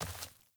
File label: Sandals Walk Dirt, Footsteps; Characters; Player